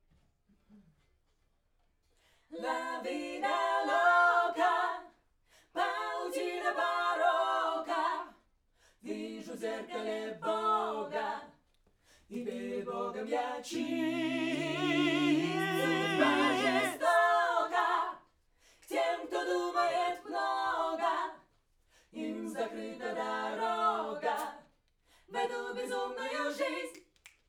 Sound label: Backs SCHOEPS